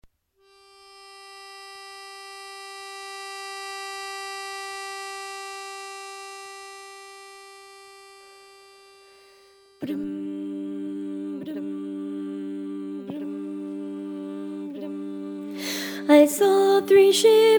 I saw three ships tenor predominant

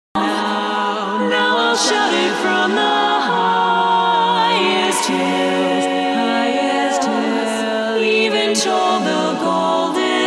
Tenor mute, harmony

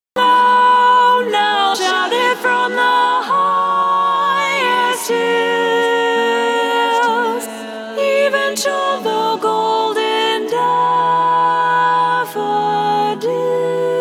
Tenor, harmony

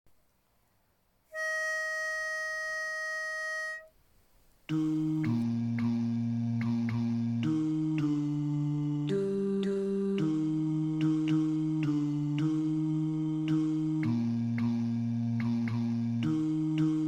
Bari w pitch; Hark the Herald

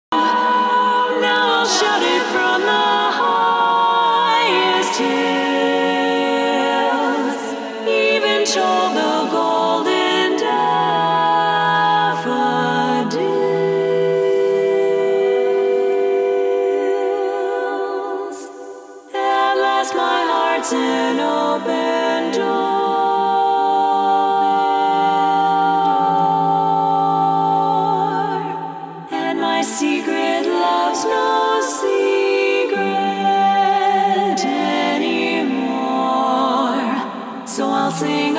Bass mute from bar 37

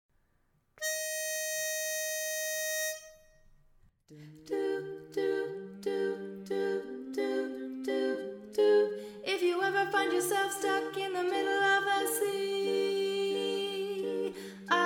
Lead; Intro, Verse 1, Chorus 1

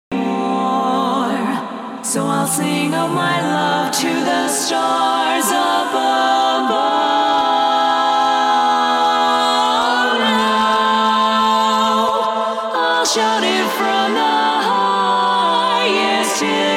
Tenor mute, harmony

